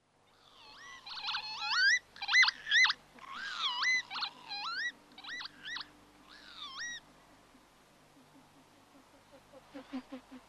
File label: Lapwing